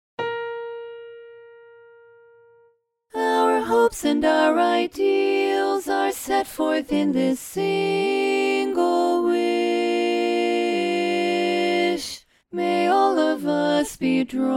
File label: learning track lead dominant